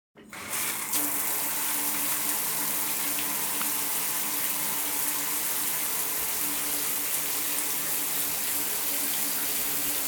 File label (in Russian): zvuk dusha